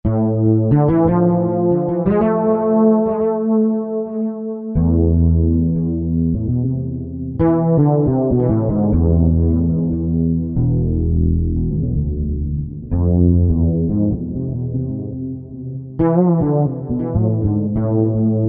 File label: Wet Fretls